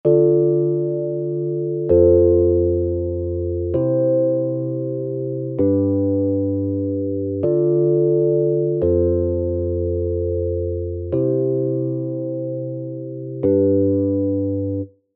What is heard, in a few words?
timing